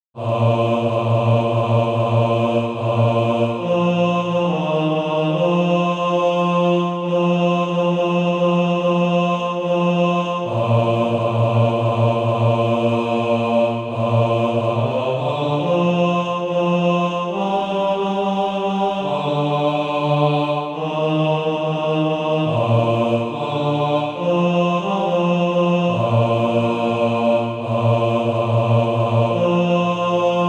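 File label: bas, Practise Music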